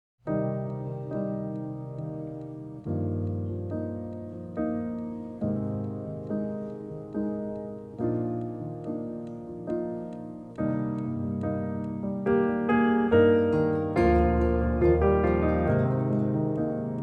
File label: Gospel